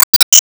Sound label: sword pullout; Se Enemy Temple; disney star smash; android